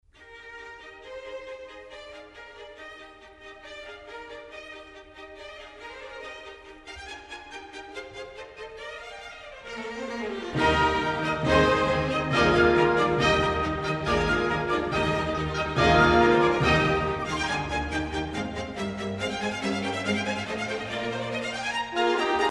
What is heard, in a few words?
trumpet concerto e flat major; haydn